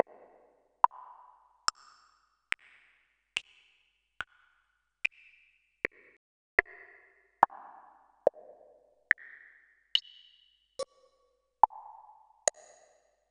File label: Tenori-On Mouthkit; Yamaha